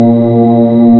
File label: Wellhead Device